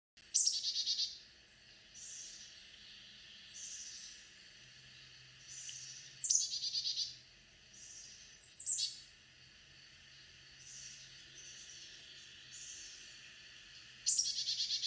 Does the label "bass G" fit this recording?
No